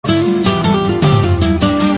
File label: melody